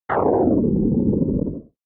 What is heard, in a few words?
SNES Shooter Missile